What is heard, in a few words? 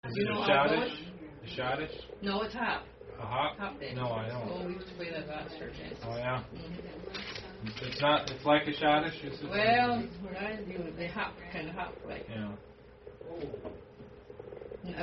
Hop Dance